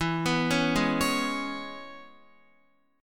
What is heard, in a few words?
E 6th, down, Guitar; arpeggiated